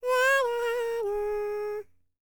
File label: HIMALAYAN, World Voices; ILIO; Vocal Planet VOL-5